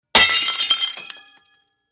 Plate Smash

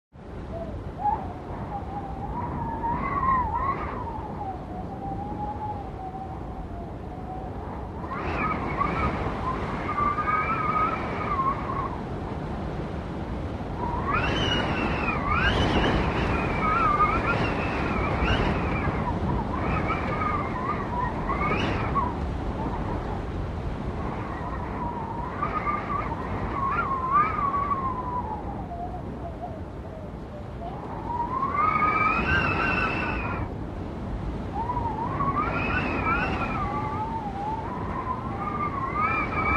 Harsh Whistling Storm Wind; rattles

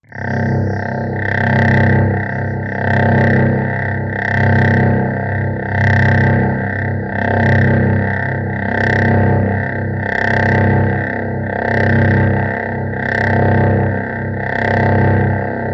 Core Elements Vibrating Generator Sweep Rhythmic; power core hum

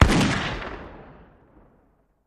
Musket Shot Single

